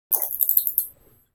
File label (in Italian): Pipistrelli; Batbox